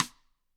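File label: Gretsch snare drum; ILIO; Double Platinum Drums 1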